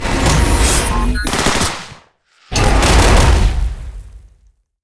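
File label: Mech Gorilla attack; monster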